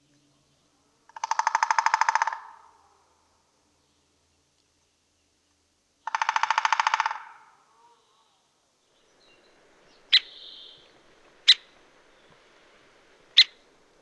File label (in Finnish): pohjantikka